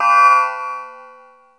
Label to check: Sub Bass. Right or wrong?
Wrong